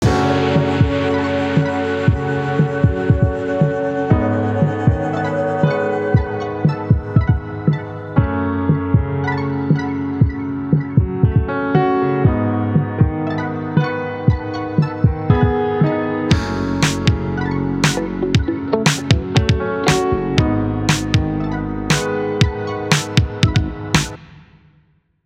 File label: DISCO instr